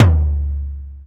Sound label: Tom DMX Low; Drums